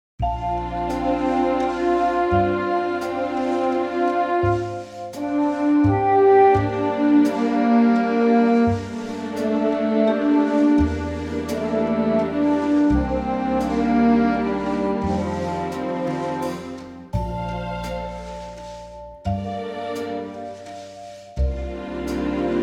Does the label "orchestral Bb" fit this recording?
Yes